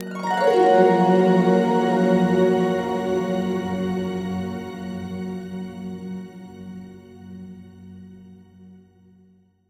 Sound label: Harp Glissando; CB Sound Design; Dreamcatcher; The Sound of Dreams & Magic